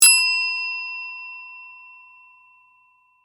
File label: Bell Ring Desk, Music, Bell, Ring, Desk; Bells (Sound Effects:Musical)